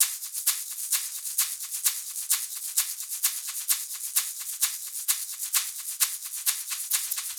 Shakers; Latin (Латино)

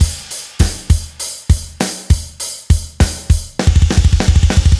Crystal Drums

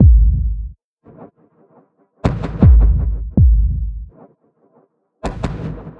Deep Punch C; Ударные и барабаны (Эмбиент); Drums; loop; Ambient; 80BPM; ambient vol. 3

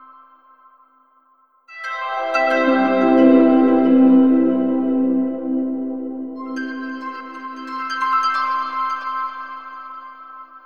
FX Harp; арфа

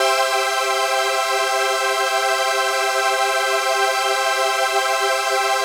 Sustain Pad; пэды

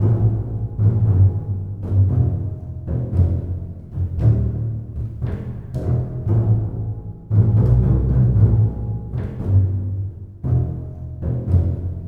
bass pizz; средневековая мелодия

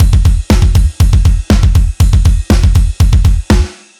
Fast Kick A

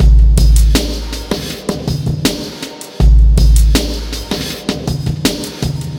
Grungy Beat, ambient; ambient vol 2

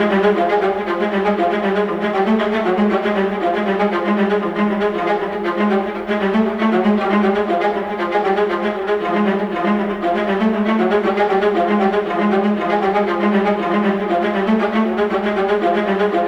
viola; звук для экшн сцены виола

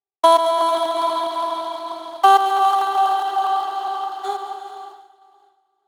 Sweet Vibe; Deep House

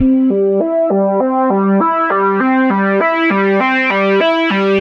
Rising A, metal

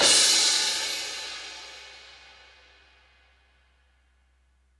Crash

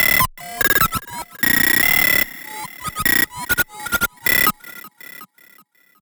Computer; Downbeat

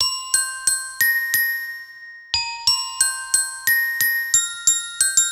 Glockenspiel; mallet

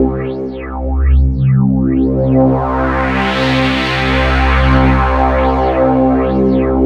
Deep Sweep; Звук синтезатора Техно-транс; 140BPM; loop; Synth

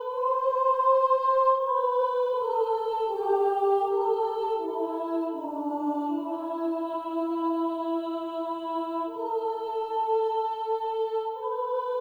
Altos, movie; Сэмплы хора (Movie Score); choir; film music; Вокал; 80BPM; Музыка для фильмов

